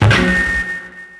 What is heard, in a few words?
guitar walk over; Max Payne; ragnarock